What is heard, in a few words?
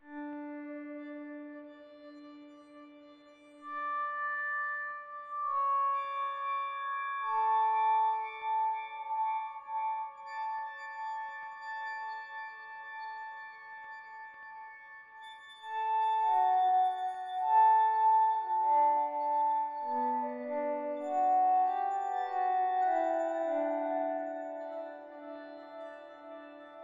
lead